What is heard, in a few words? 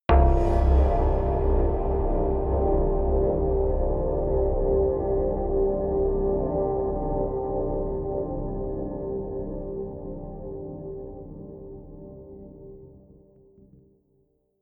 synth hit drone atmosphere; whooshes rises